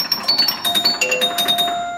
Pinball; Mata Hari

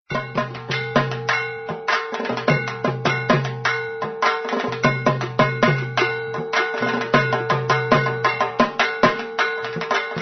Dhak Ringtone

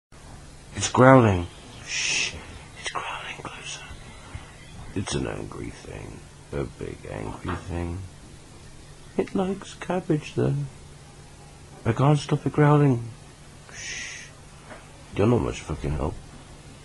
Growling

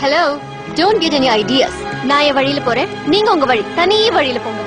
Robo Message Tone

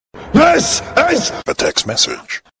Shouting Sms

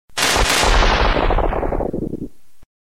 Lightning

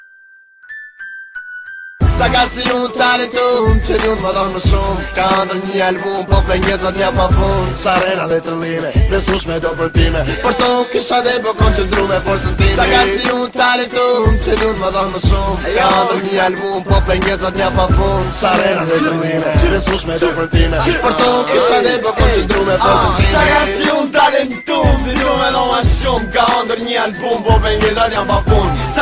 Noizy Beat

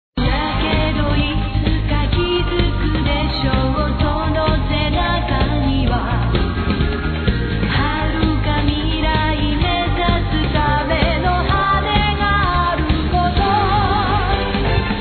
Japan Funny Song

